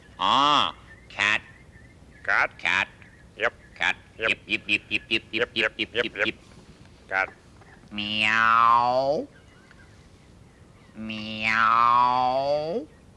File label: Martians Meoooww